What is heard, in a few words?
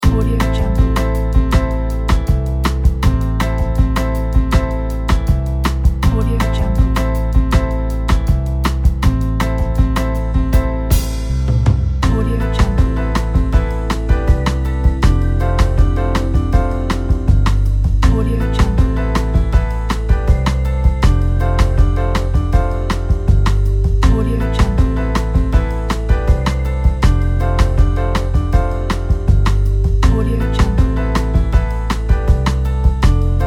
jazzy